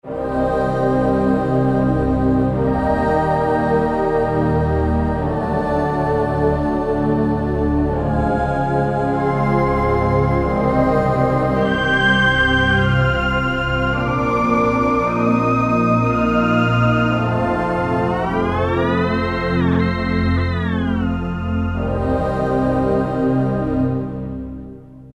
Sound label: Digital Choir, Kurzweil K2000; Kurzweil K2000 V3